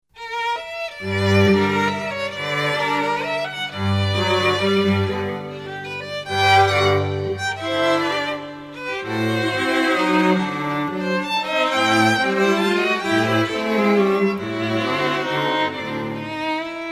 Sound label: Classical String Quartet; Alt Wien